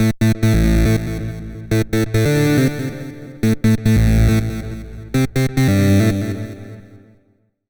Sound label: HARD EXTRA 140, Hard Trance